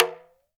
TALK DRM, Heart of Africa; Spectrasonics

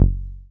SYN 303, Roland TB-303

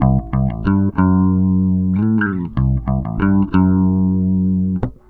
STRANG 94 C, Bass Grooves; USB Soundscan vol.30; AKAI

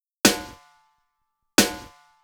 Snare, Snare Patterns; Beat Components

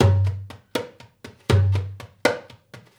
Dumbek; Essential Percussion

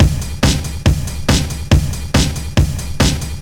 LoFi Beats, 140 BPM

